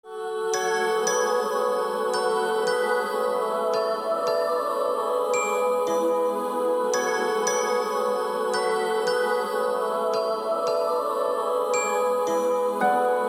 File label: Instrumental BGM; Dada Movie